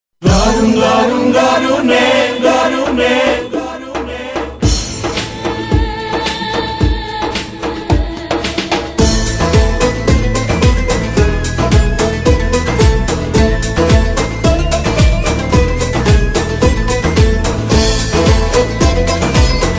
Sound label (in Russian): армянская музыка